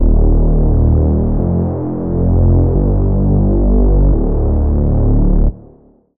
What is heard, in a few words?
Bass; Routed Sound; Vital Expansion - Flame Summoner Vol.1